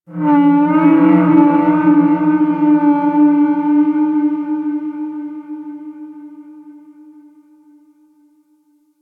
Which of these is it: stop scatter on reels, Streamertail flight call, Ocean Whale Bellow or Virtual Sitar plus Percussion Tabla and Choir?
Ocean Whale Bellow